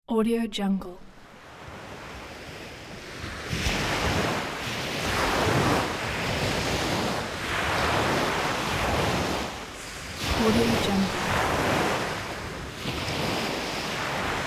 beach waves

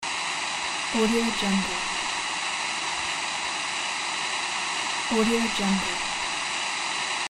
tv noise

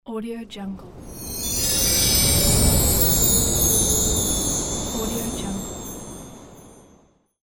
christmas whoosh

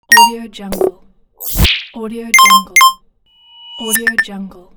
buttons and menus sounds

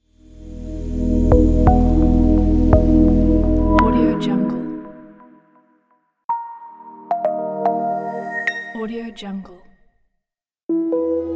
outro logo pack

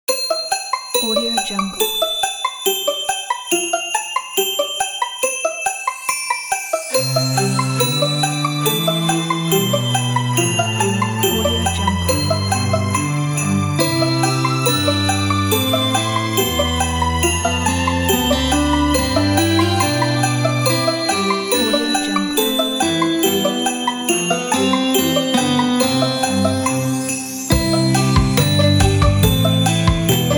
merry christmas

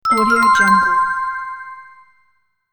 twinkle award

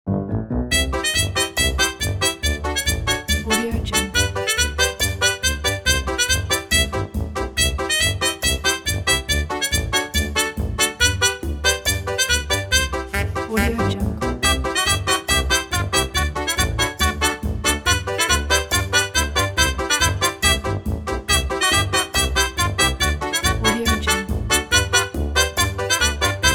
comedy happy song

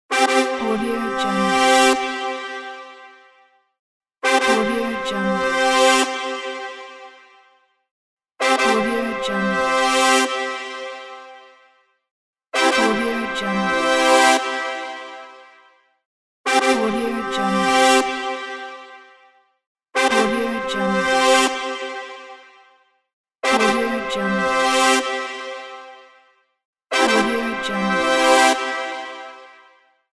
tada horns; hi2youmusic

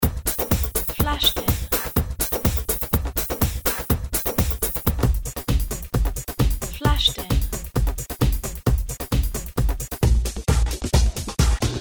beatpack; adg3 beatpack; adg3studios

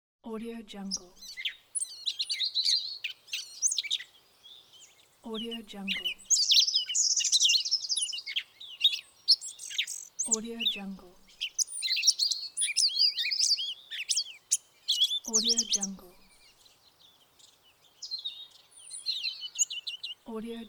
birds; incredible-sound

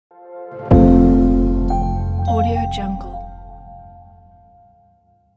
ambient piano logo

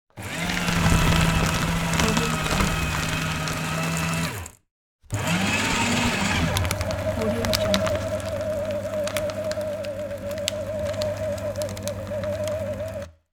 paper shredder

